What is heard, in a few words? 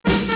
end level; pang